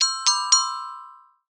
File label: new message; Lorcerbot